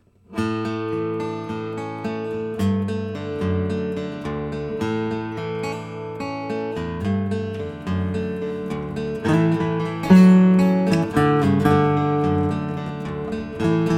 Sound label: baritone, guitars